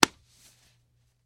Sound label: Hand Grabs, Slaps, And Pats; Flesh Slap To Face With Medium Crack.